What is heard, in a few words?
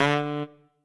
Trumpet melo